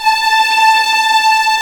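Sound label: A5 tremolo, String; East West; The Ultimate String Collection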